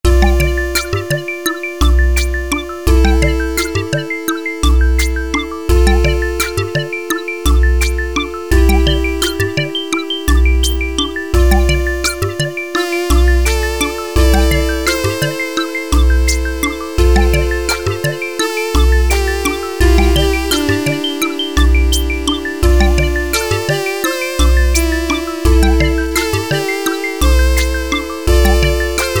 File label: 8bit Underwater Sequence; 8bit Rockers